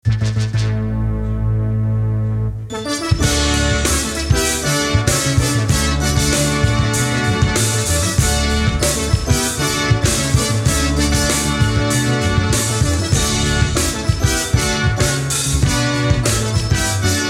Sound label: Fanfare